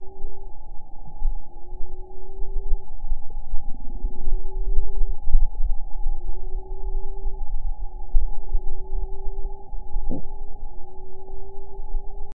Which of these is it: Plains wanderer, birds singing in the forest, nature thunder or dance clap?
Plains wanderer